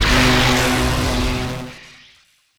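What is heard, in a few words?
Laser Fire Interior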